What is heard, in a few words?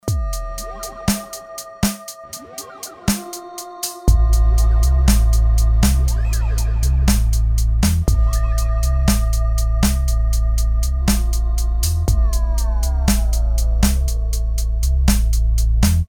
dub